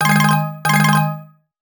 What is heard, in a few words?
app alert tone ringtone